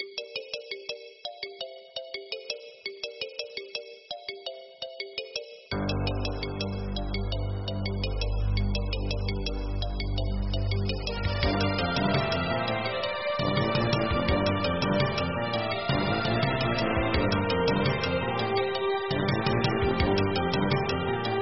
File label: Dungeon